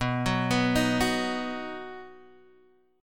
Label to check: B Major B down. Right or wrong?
Right